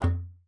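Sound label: F3, bass flute; Xsample VOL-2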